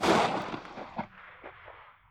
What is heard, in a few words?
colt rev; weapons